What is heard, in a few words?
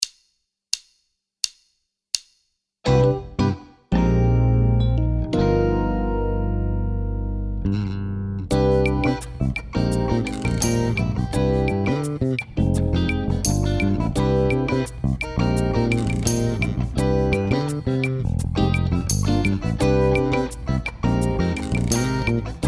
Slow Fusion, drums